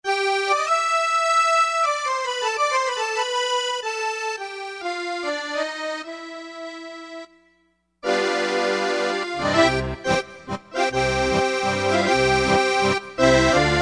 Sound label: accordion, Korg N364; Factory Combi demos